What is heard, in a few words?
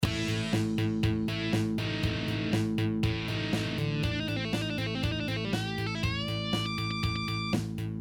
guitar shredders of 80s, shred guitar exercises